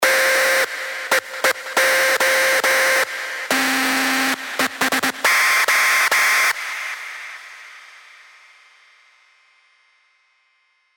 SY Screech; Electroshockaholic; revealed serum 2 rave asylum

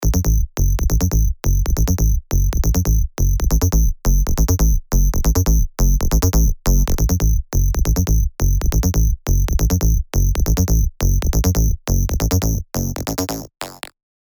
Bassline; Bassline Haze; alonso 19 year anniversary pack; Spire

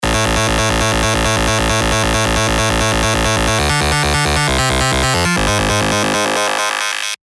Learning Loop, rolling bass; revealed serum 2 rolling bass vol 3

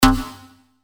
Bass Shots C; Basso; revealed bass shots vol 4

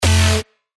Bass Shot F, synth shots tech house; Harder; revealed

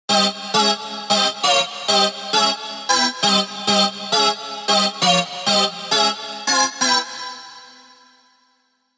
rave stabs; Area Code; revealed; Spire

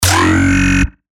bass shot F#; Crane; revealed bass shots vol 3

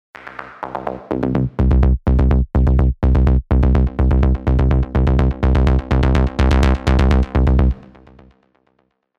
Off Dat Beat, Serum; alonso 18 year anniversary pack

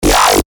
Bass Shots G; Abort; revealed bass shots vol 3

